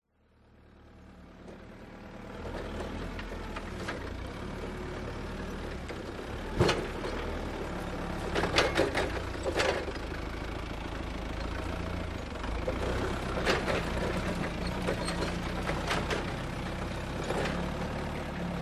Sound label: dump truck approaching from the distance then turning off; Sound Ex Machina